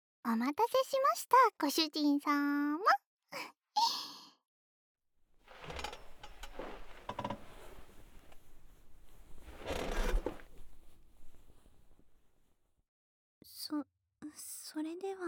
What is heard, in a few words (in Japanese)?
耳舐め手コキ; くちゅ音無し